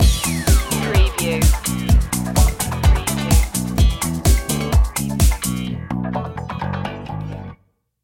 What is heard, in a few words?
funky juice stinger